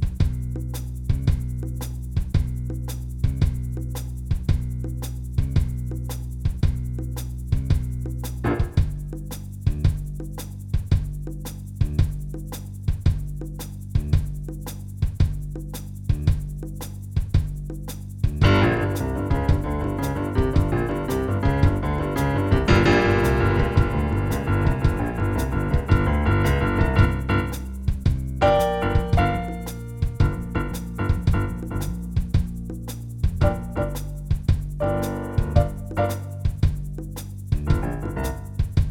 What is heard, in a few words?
sick beat